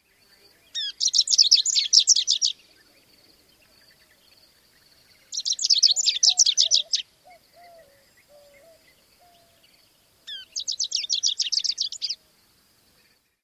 songs; AZ

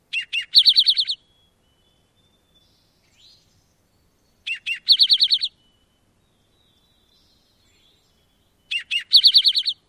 song variant

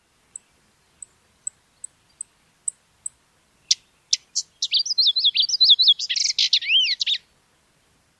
flight song

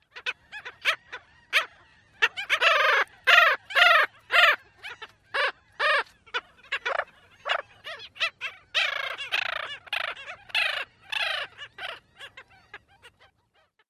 churring calls at nest

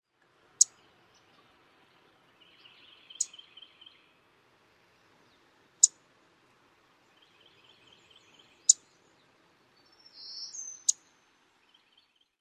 chip calls; TX

